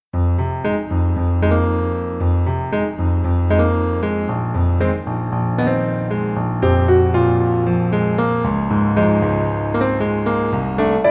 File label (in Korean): Piano ver 남자키